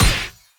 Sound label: attack light; bloom minigame